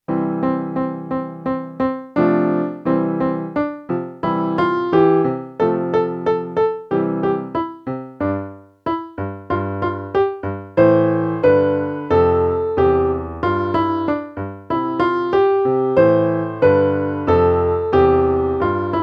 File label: Rhythm